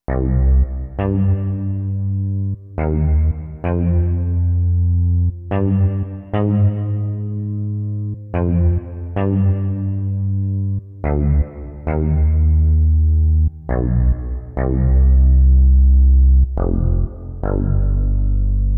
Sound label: Hammer